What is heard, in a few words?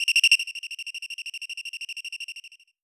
insect chirp synth low